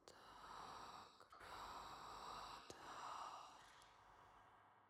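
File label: voice; pantocrator video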